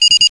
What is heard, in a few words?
alarm